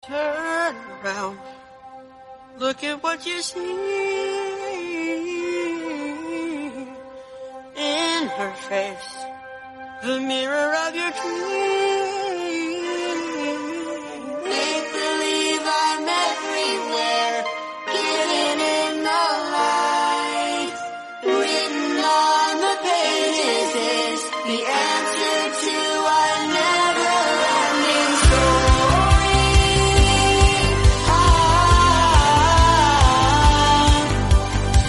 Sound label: bgm, english; vecna; stranger things